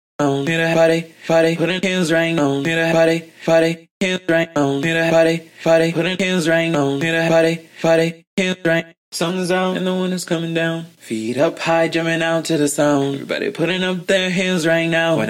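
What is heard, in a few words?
pop dance 110 E major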